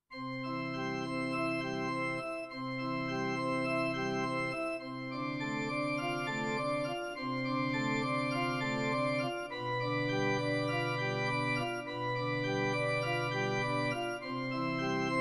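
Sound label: Pipe Organ Tutti, digital piano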